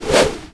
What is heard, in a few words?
Sword Spin